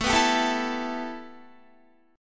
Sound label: A 7th Suspended 2nd Guitar; A7sus2; strummed